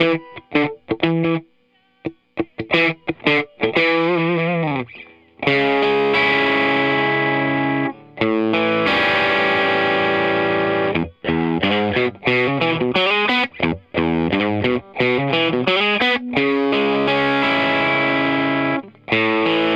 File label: Electric